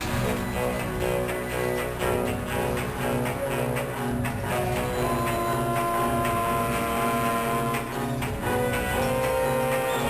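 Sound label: BGM combat boss loop; Avatar The Last Airbender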